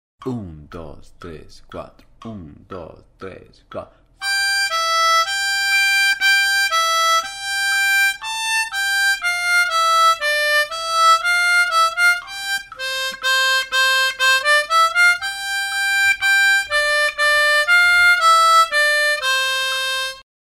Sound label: THIS OLD MAN 120, armonica